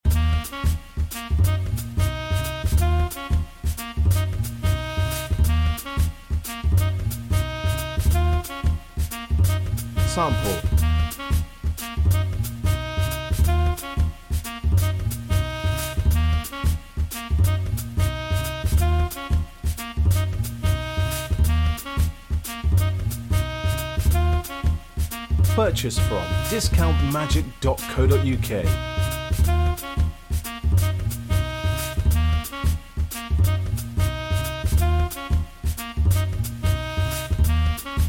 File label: horn jazz